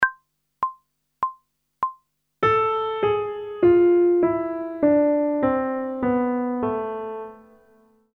Minor Scale (Harmonic) one octave descending, pop vocals; grade 3; Technical Work